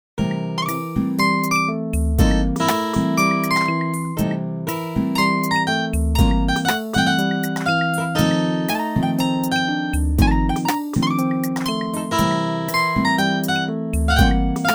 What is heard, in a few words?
MIDI Blues; spellman studio